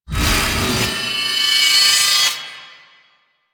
Heavy Hammer Attack Charge; Weapons

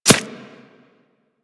Arrow Impact Flesh; Warframe; Weapons

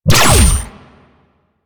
Trip Laser Impact; Warframe

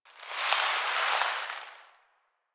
Cloth Stand Up, Foley; Apostasy

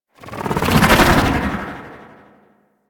Void Carry Out; Generic Abilities; Mods

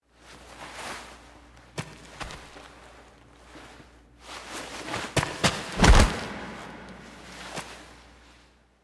Foley Hand Fall; Cinematics; Operator; Warframe; The War Within; Mountain Pass

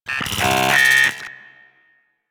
Amalgam Moa Vocal Idle; Warframe

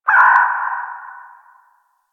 Bird Distant; Ambience; One Offs; Warframe; Eidolon Plains

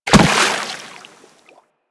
Infested Runner Step Water; Warframe

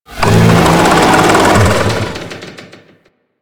Thumper Charge Groan B; Warframe; Grineer Thumper Bot

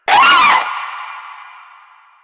Proto Infested Runner Vocal Short Attack, Enemies; Warframe

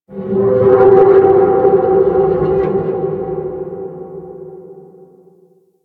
Texture Large, Ambience; Warframe; Orokin Moon

